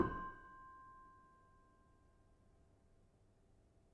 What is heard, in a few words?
Kempyang, gamelan; gravityharps; timbrebox